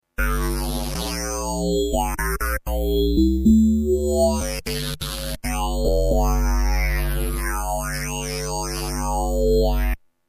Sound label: G2 Digitizer